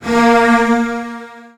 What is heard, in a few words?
A#2, Virtuoso Strings, 16TH; ILIO; Kirk Hunter; CL 16TH